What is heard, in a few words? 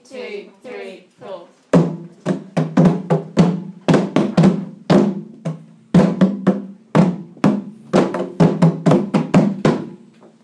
drumming compositions